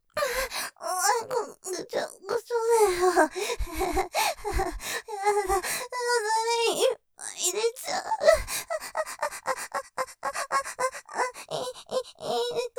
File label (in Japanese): 喘ぎ◆おまんこぐしょぐしょだよぉ、いっぱい出ちゃう; ステレオ